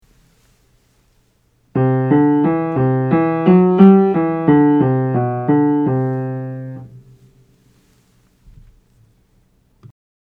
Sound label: Modal Scales Aural Training exercise audio